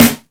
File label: juicy snare drum a sharp key; Duration 0:01; Mono